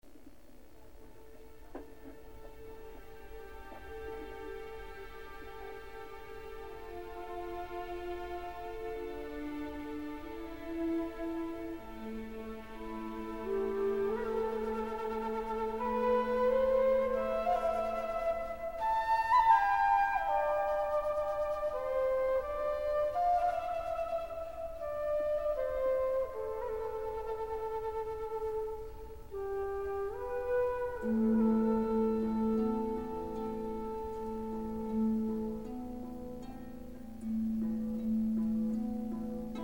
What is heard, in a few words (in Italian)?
Strumentale - Flauto di Pan e arpa celtica; Colonna sonora Braveheart